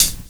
hi-hat; Univers Sons - Kitch 70s

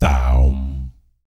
BS, Vocal BASS FX

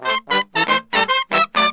120 AM R, BRASS SALSA; Best Service ProSamples vol.14; AKAI

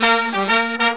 Killer Brass Riffs

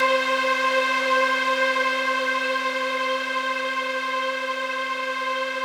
SOOSYN C4, SOODOSYNTH, Yamaha VP-1; Music Store; 90's Sample CDs

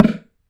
Thunder Drum

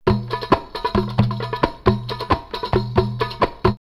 Aqaba 134, drumloops World Ethnic; X-Static Goldmine

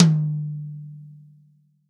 TOM, Real Drum Kit, POP KIT

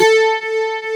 SYNTHESIZER DIGI DECAY

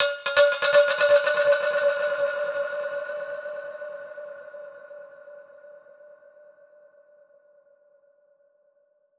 Perc Hit, dub percussion; musicradar

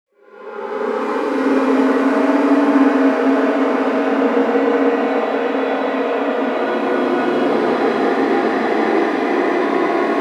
INTERSTELLAR WARP, DEEP ATMOSPHERICS; Sonic Foundry (Sony Creative Software); Vir Unis Infusion

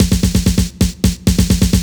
Snare Roll; Zero-G; Soundclash Nu Dance Electro; Construction Kits; Feet First

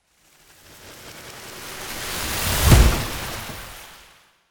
Drum

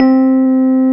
piano Rhodes; Sound & Vision; Gigapack I CD 2; PIA E-PIANOS

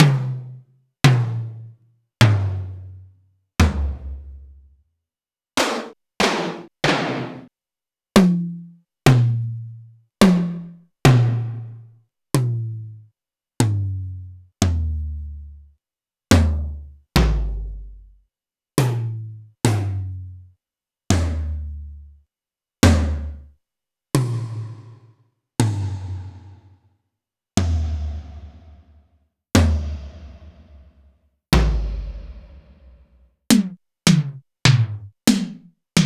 Alesis D4 Tom

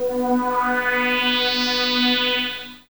SYN, Hip Hop and R&B Drumloops; Best Service; ProSamples vol.01; AKAI

